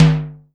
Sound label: SIMMONS SD-5 drums